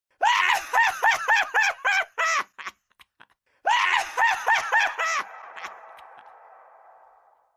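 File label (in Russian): мужской квакающий демонический смех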